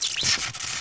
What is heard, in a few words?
smash wires; buttons